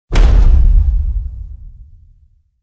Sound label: Dino Foot Hit Deep; Ninja Tracks